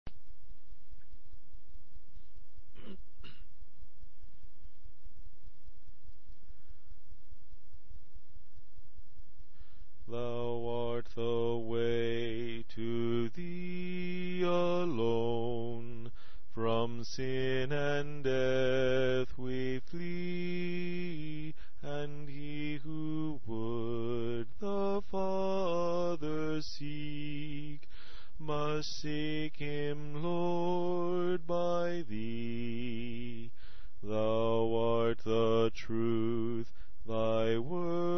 Bass

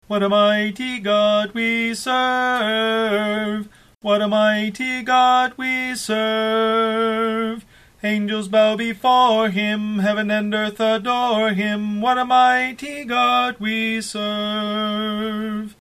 tenor

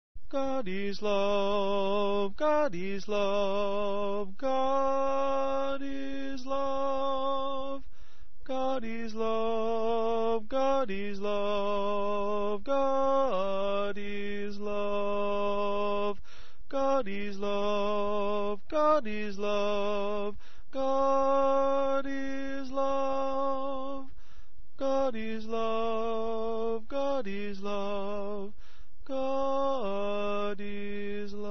The Greatest Commands Tenor

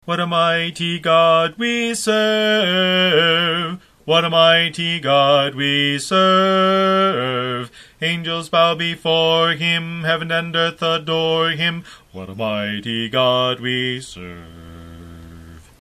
bass

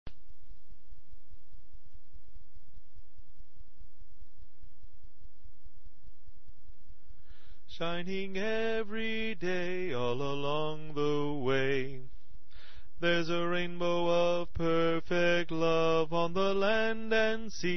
The Rainbow of Love Alto

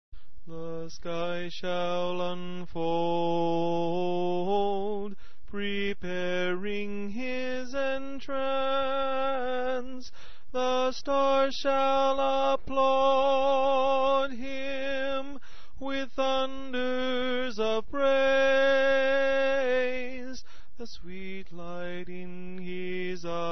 tenor

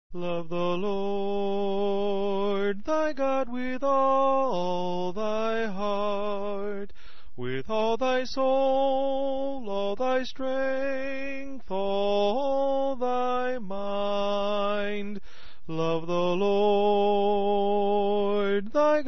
The Greatest Commands Soprano

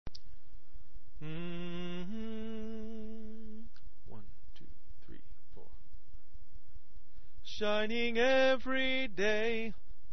The Rainbow of Love Soprano

